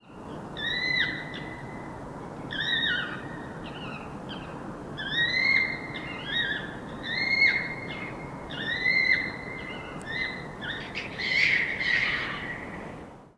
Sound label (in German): unbekannt, vogelstimmen